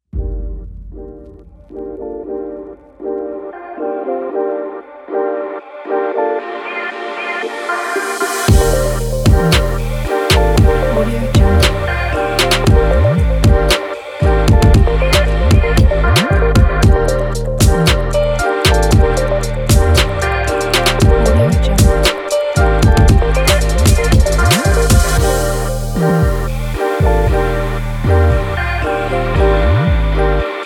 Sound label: upbeat hip hop fashion